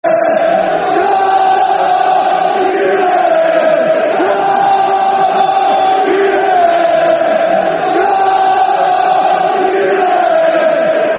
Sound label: Come On You Reds, Football Chant (Soccer Song); Doncaster Rovers Drfc